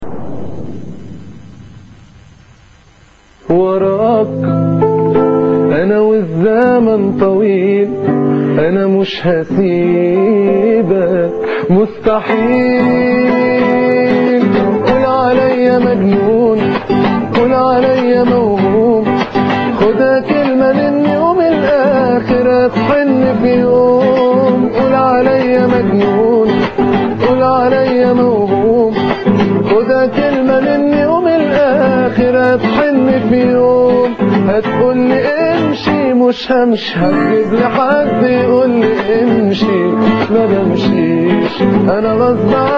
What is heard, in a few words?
Guitar; egypt